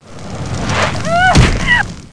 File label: fly n hit; XMEN2